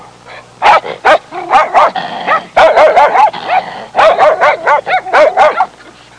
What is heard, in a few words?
Dogs about to fight